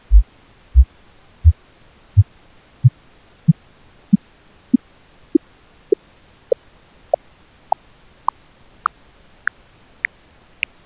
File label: Wavelet Train; loudspeaker maximums; peak SPL; loudspeaker maximums part 2